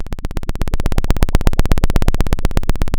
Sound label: pop, Sound of a helicopter